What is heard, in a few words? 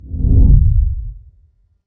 cinematic impact whoosh